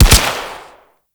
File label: Goo Gun Shot